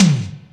Tom; Percs

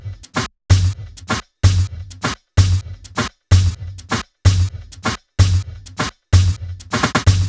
Minimal Loops

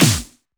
Snare; Vengeance Samples

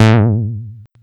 Bass Juno A; Vengeance Samples

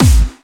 Bassdrums Clubby Kicks; Vengeance Samples

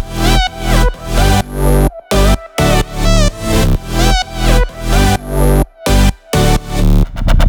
128BPM Melody Root E; Sunshine; Vengeance Samples; Sunshine Kit

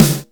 Claps & Snares

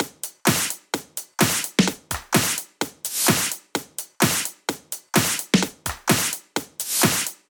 Sunshine Drums 1, 128BPM; Vengeance Samples; Sunshine Kit

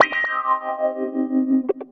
125 c, guitar licks; PS-28 Vocal House Samples; instrumentloops

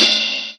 Ride, Cymbals; Vengeance Samples

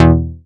Synth Shot; Vengeance Samples

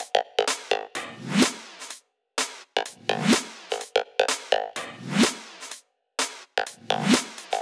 126BPM Pong Kit

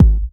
Bassdrums

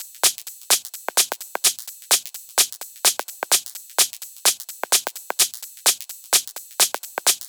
128BPM Kit; VFH1 128BPM Roboto Kit

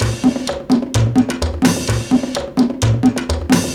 Latin House Loop 128 BPM; Vengeance Samples

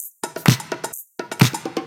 Percussive Loops 128BPM; Vengeance Samples

